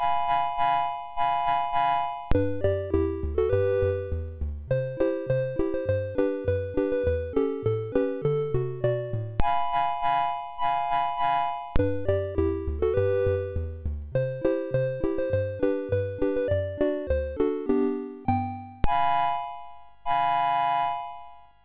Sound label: Season Jingles, MOS6581R3